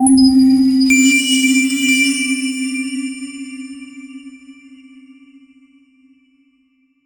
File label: tone mystical horror eer